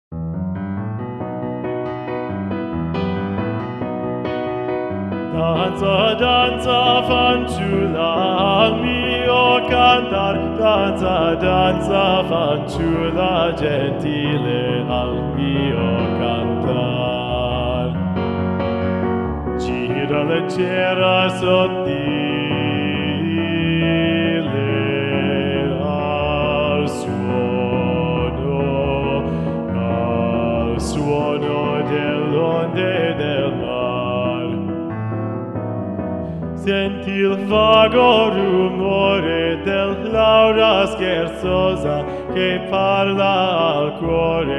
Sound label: danza danza, e min, chorus